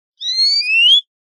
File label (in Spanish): quejas pui, verderon